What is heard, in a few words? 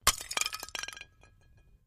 Ceramic Breaks; clay & ceramic